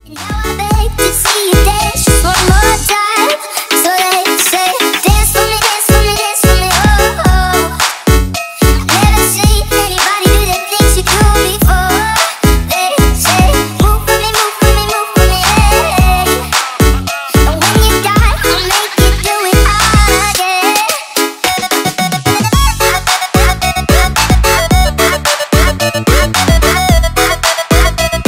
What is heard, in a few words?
remix